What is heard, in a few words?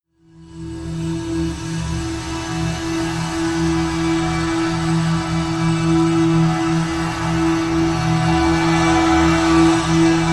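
drone light abrasion e; brand x music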